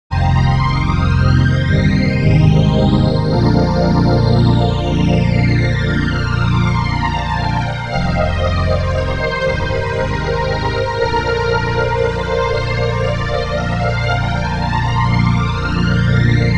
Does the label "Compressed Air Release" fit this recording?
No